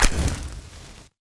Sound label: Flare Impact; grabpack upgraded; pp3